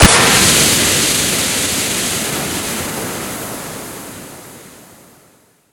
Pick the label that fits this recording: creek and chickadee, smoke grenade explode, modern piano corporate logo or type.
smoke grenade explode